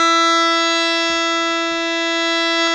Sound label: HARMONIUM, World Instruments